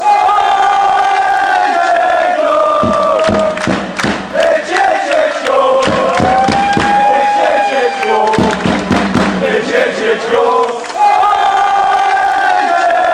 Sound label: patricks chant